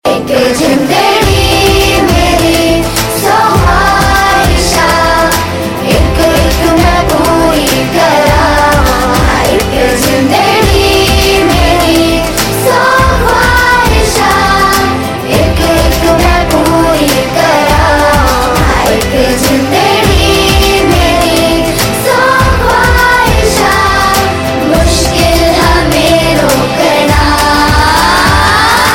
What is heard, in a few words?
Hindi Ringtone